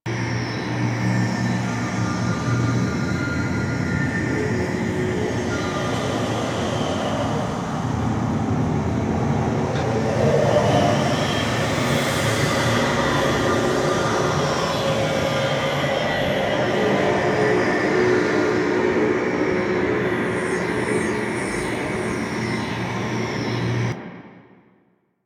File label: Hub Ambient Loop A; Warframe; Ambience; Tenno; Apostasy